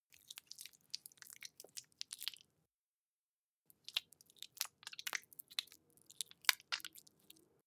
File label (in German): softsoap hand smear, badezimmer